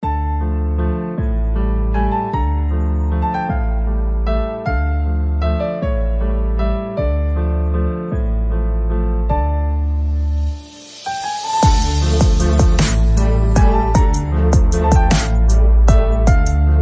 Chance meeting waltz hip hop version background music